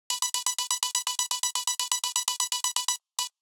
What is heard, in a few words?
DICE Electronic, Roll; Samples; ArticulatedSounds; DICE Sfx Party (Extended)